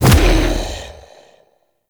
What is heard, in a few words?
Edge Kick Large; Warframe; Pacifist